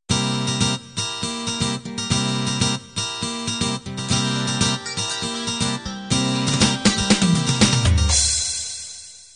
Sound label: 6 string beat intro